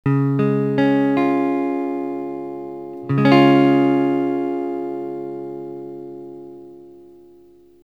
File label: d flat major, guitar